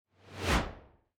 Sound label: fast whoosh